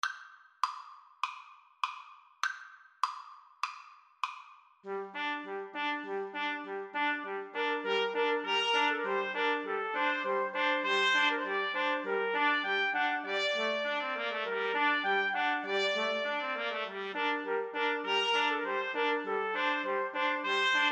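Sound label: trumpet trio, karaoke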